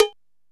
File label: drum, drumcomputer EMU SP 12; Best Service; ProSamples vol.55; Retro Sampler; EMU SP 12+1200